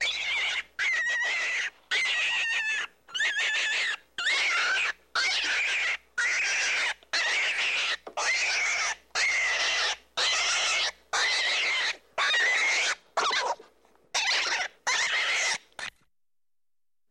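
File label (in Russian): krolik golos, rabbit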